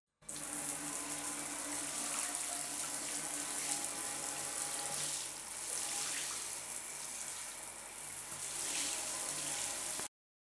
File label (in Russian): zvuk dusha